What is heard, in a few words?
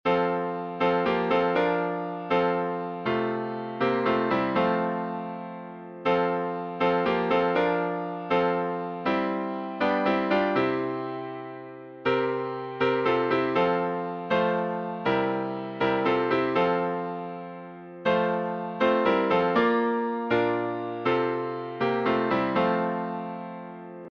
Hymns